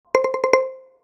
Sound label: notification critical; Системные звуки и уведомления iOS 18 (iPhone 16, 16pro, iPad)